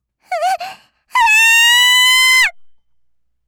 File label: kyaa; gaya